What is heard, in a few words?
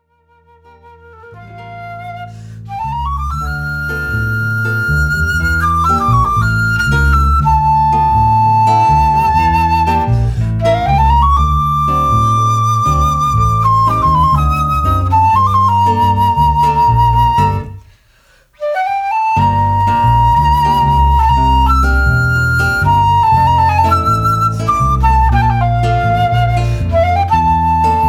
Afro Cuban Trio